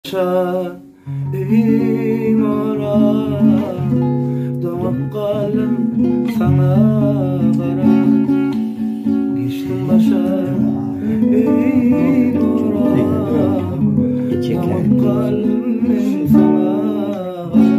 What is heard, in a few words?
gitara